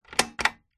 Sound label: office slide projector vintage button press off voigtlander perkeo automatic; 1960; zapsplat